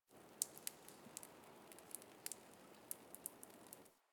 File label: Exterior Water Drip; Warframe; Ambience; Nineteen Ninety Nine; DE